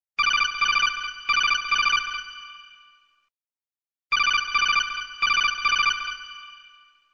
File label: Codec; MGS